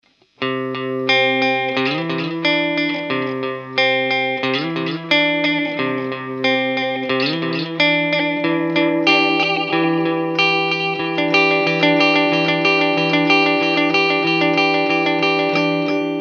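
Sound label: Tube echo, Line 6 M13 guitar processor; Delay; слегка перегруженные, тёплые повторы, затухают быстро, звучат не громко; Maestro EP-1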